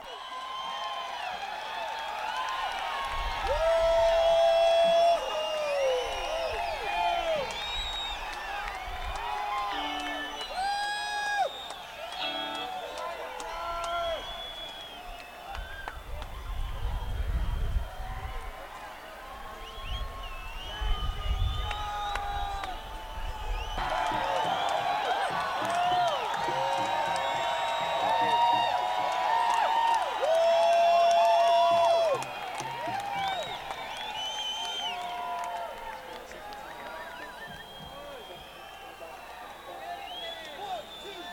Crowd

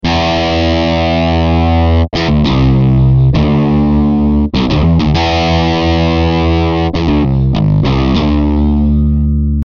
Electric Guitar; Rock

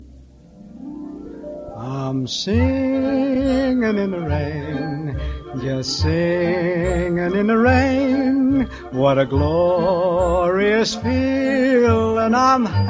Singing

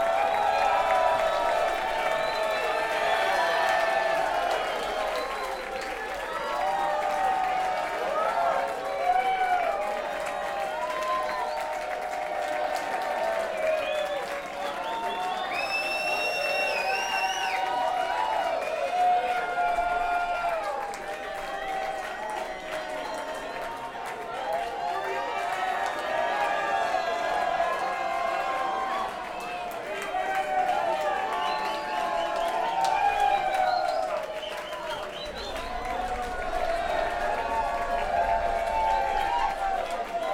crowd; AKG ck63; mixpre6

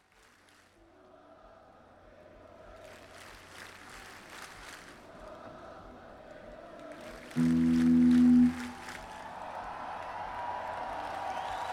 Crowd, Tuning

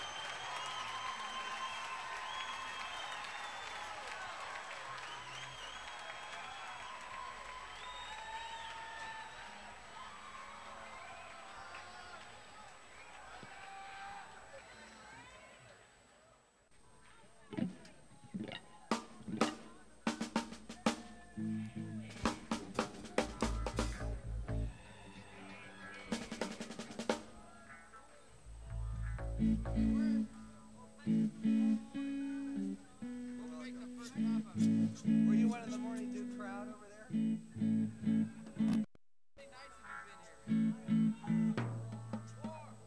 Tuning

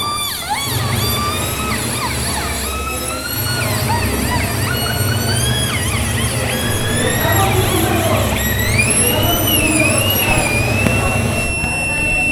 noise, Quito Ecuador; No-lugar

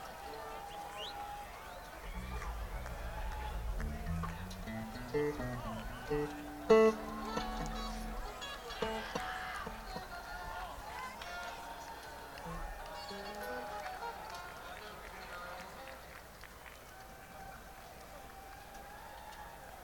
Tuning

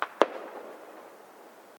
Fireworks, drum samples

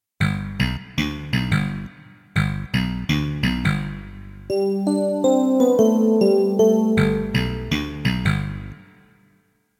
Fusion, Kawai K1

